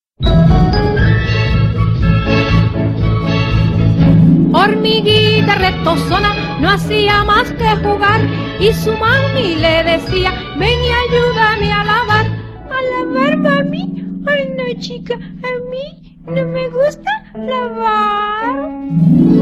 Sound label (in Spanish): Canción Infantil